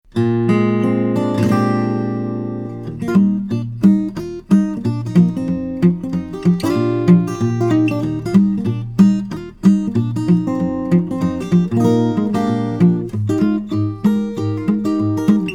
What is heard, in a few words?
French Kiss